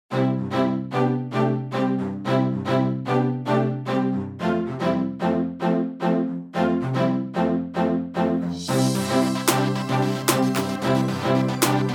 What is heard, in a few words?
Instrumental